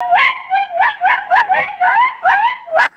rapid spotted hyena yelps and groans; animals

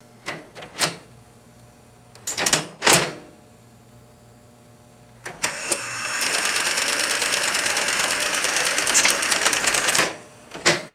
vintage mechanical weighing machine operating; game

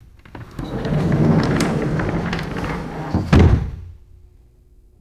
heavy wooden sliding door rolling shut; doors

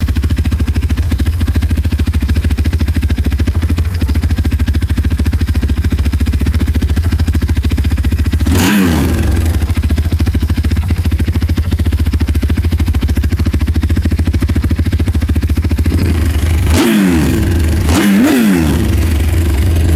harley davidson motorcycle idling and revving; vehicles

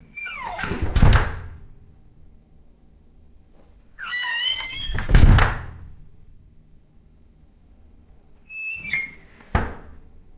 squeaky glass shower door opening and closing; doors

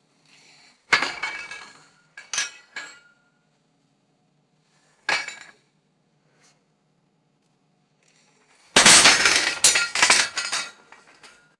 hollow metal pipes rattling and clanking

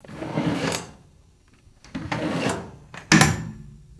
metal appliance drawer opening and closing

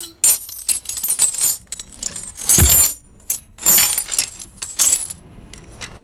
sharp metallic chain clinks and impacts

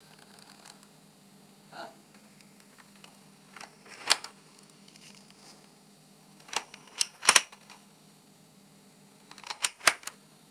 hollow flimsy plastic clicks; ui

